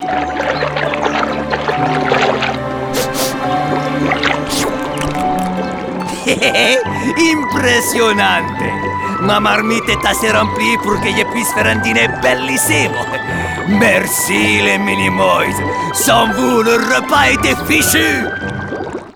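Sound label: Outro Victory French; arthur and the revenge of maltazard; wii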